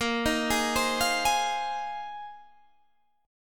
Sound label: Bb 9th Suspended 4th Bb down Guitar Open D